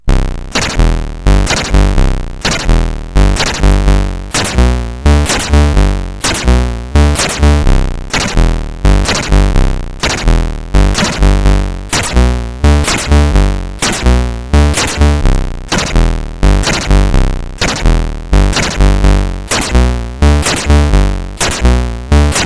Bas, CSG8580R5; Source SoftRunner Group

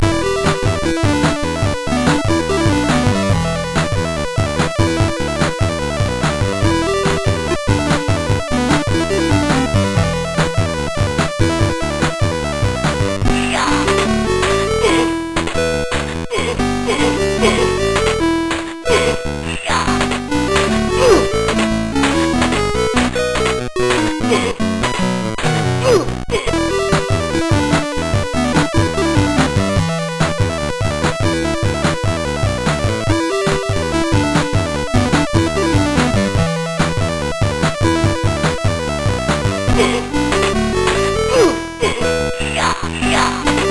sid MOS6581R4; Chop n Drop

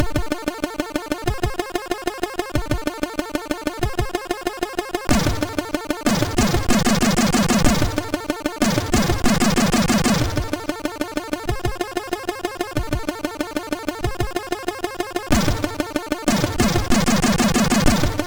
Intro, MOS6581R2 SID; Creo

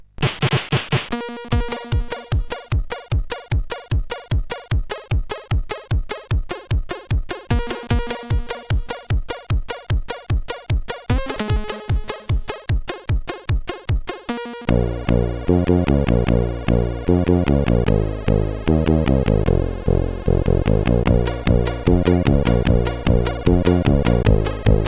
sid CSG8580R5

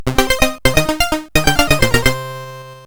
sid CSG8580R5, GAMES; Bionic Commando USA Version

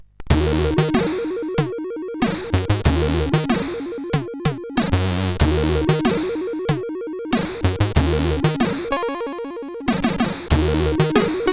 Slow Funk, sid CSG8580R5

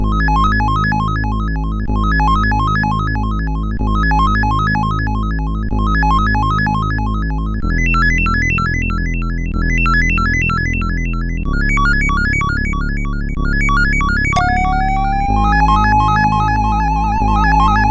Epic Intro, sid MOS6581R4

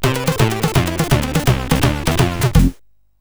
Oeglejazz, sid CSG8580R5

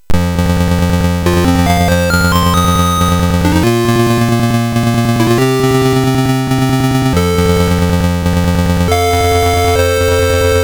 Slap Fight, MOS6581R3; hvsc

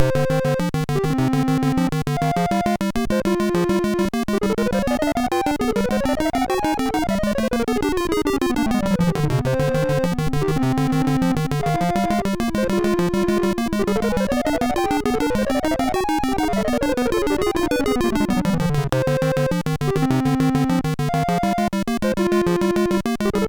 sid MOS6581R2, GAMES; Arachnophobia

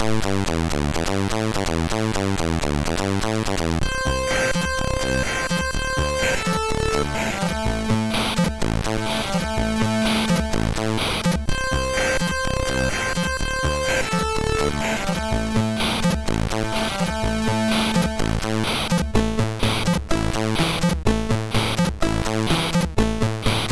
MOS6581R2 sid; Get Fresh

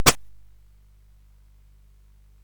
SFX; Narco Police

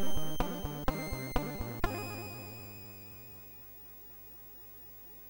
sid, MOS6581R3; Atic Atac C64

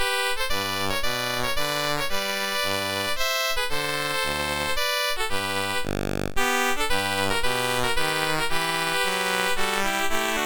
Minuet in G, MOS6581R3

